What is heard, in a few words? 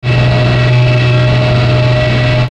static low; Static Low Hum